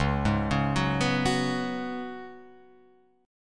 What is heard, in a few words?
Db 7th Suspended 4th, Guitar, Open F, chord; JGuitar